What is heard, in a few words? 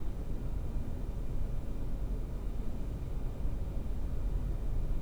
condo corridor